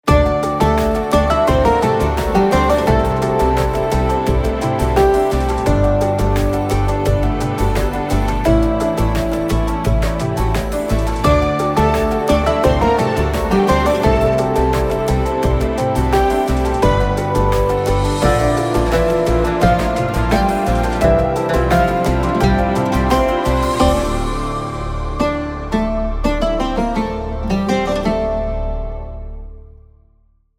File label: Sonic Branding Upbeat Version; Sharjah Airport Sonic Branding 30 seconds